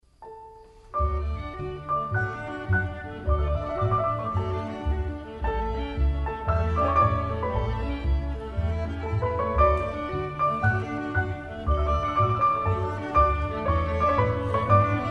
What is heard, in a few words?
piano quintet a major; schubert